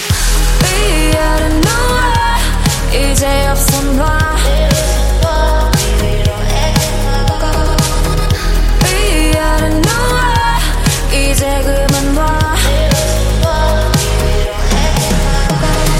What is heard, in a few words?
Chorus; Noir